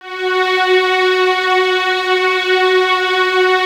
F#4, String Ensembles Violins; Miroslav Vitous; 11 Violins

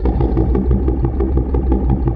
CUTTER; AKAI Anime Sound FX; SCI FI

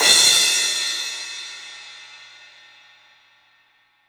Studio Cymbals

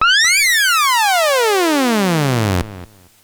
sci-fi FX

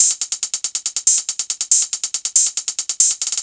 NRG Cymbal; Drums; Cymbals; Trance; Sonic Foundry (Sony Creative Software); Trance NRG VOL-1

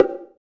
Bongo, Roland BK-5; Percussion

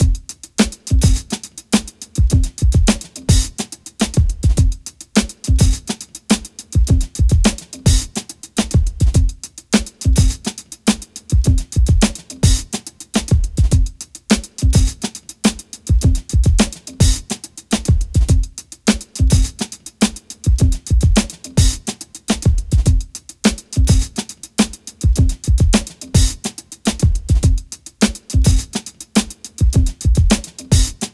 105 Hard Roomy, breaks; Lockdown Breaks

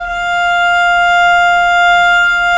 VL F4, VIOLE, Historical Instruments; USB Soundscan vol.23

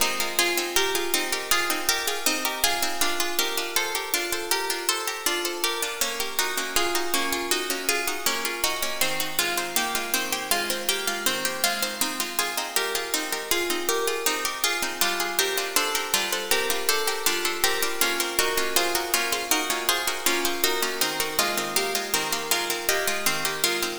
Synth Arpeggio, Synths; Eclectic Electronica

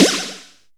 Shock Snares, Percussion

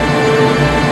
DRAMA LOOP; Q Up Arts; Dream Experience

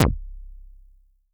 K Juicy, Vermona Mono15 Drums Kicks

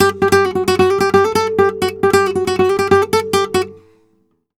Flamenco Guitar Picado, a; Best Service ProSamples vol.46 - Flamenco

